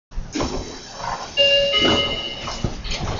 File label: door; seibu20000